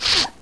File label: sniffle; People; Sound Library 2000; Wayzata Technology